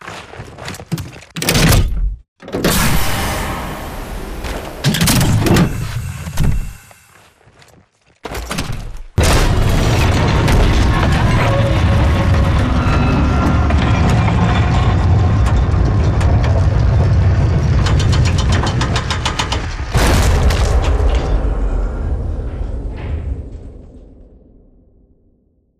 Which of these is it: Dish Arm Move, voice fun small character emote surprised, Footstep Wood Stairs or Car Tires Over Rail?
Dish Arm Move